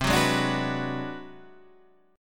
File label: G sharp 7th Sharp 9th Flat 5th